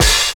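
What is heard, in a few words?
OPEN HAT; East West - Phat & Phunky CD1; 90's Sample CDs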